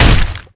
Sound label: damage